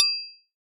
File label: synth